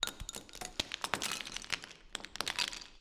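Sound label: scattering small pebbles one by one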